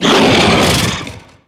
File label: roar; carrion queen